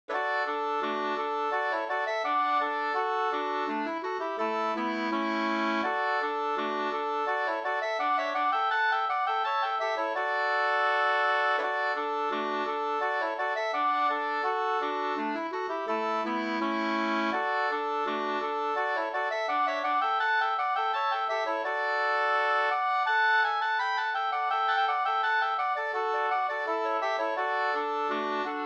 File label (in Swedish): ganglat